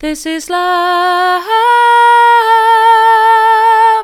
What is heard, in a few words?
this is love soft, vocal